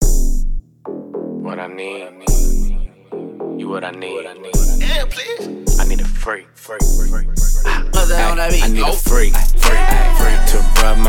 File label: 23-26hz Low bass